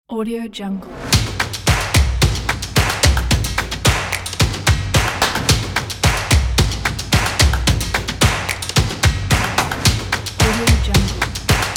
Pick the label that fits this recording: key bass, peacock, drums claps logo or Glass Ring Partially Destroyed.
drums claps logo